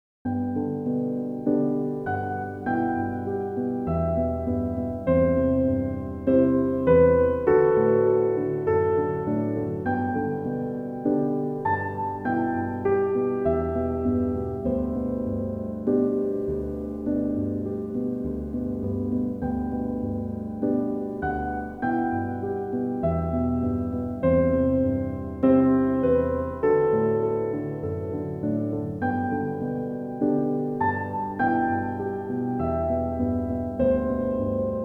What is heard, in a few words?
Peaceful Piano